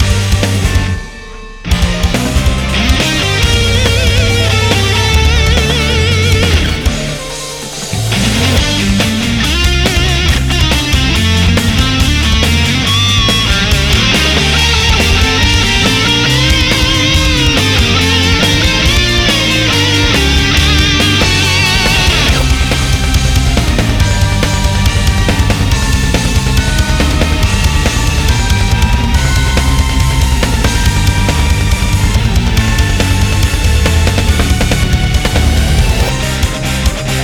Impetuoso; Kidou Sentai Iron Saga

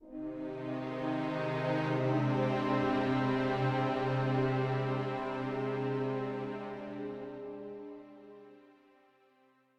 Music Segments Walk Big Cold 64bpm End; asterix obelix slap them all 2

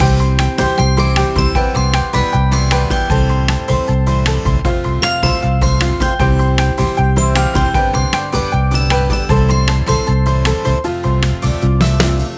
Loop; Blueprint Loop; Property Brothers Home Design

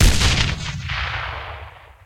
explosion med; Puddle; puddle plus ios gamerip 2018

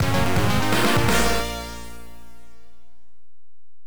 Level Complete; Jim Power; MS-DOS

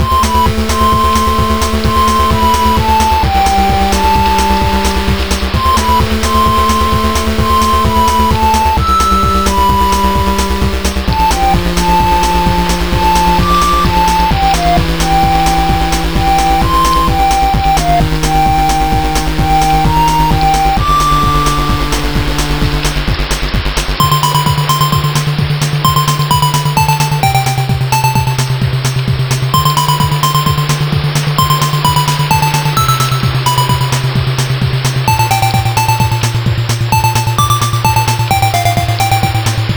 Town Rainny; Adventure in the Tower of Flight

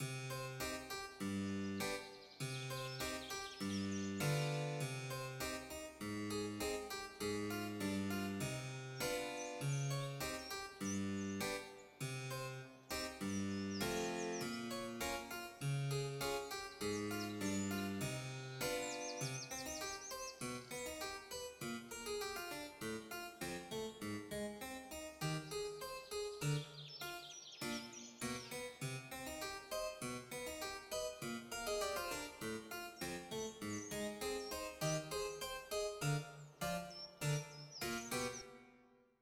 Plaza 100bpm

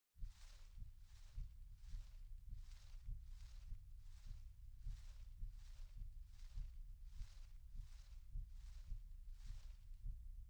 Creatures Awake

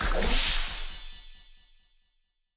Eye boss Thorn Burst; Super Mombo Quest

